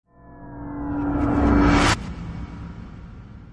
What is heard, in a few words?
crescendo